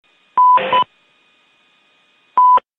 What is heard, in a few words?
Military Radio Sound